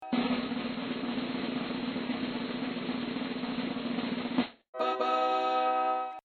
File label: drumroll bah bah